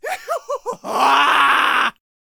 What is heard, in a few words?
Harpooner Attack Vocals